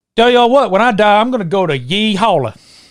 yee hawlla

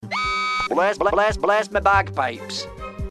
bagpipes

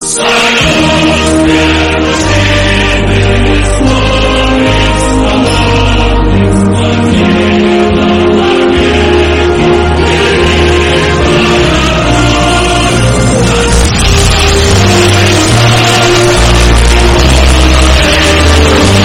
soviet national anthem ear rape

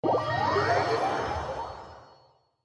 teleport; genshin

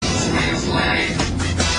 spin slide

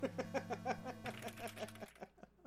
laugh

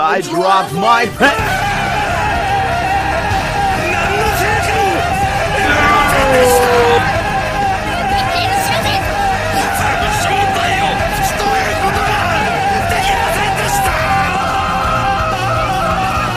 pen

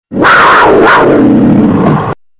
panther roar

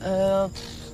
euhpfff

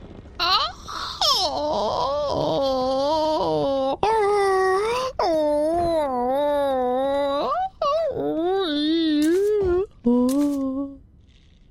noises

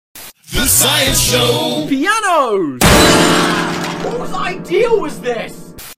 piano; the science show

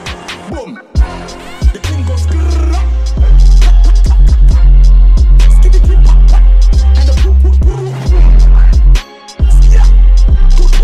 remix bassboosted

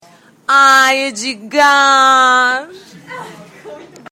ahhhhhh

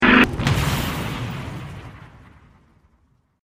the crash

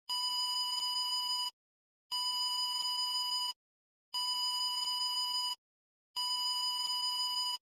ghost siren

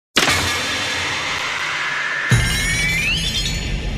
dramatic reveal; dbzk

